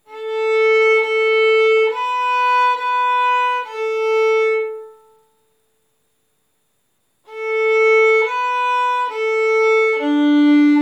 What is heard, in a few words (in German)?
Geige arco